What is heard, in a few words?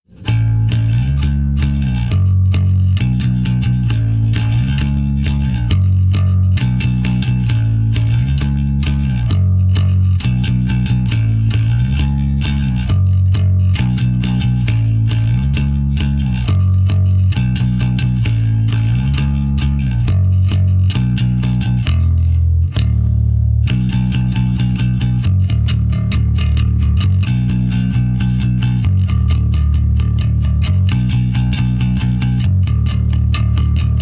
rhythm